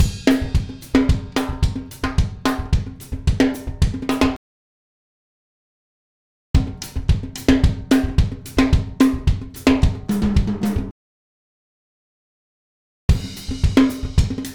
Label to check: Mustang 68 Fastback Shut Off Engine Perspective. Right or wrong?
Wrong